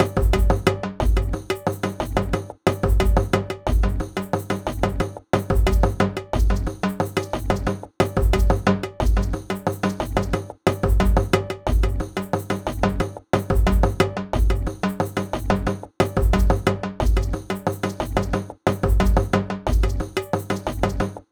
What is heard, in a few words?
World Kit Different 90, Percussion Combos; M-Audio; Pro Sessions VOL-38; Abstract World Fusion 2